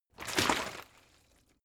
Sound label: bottle water sparse upend hard; gastronomie; berufe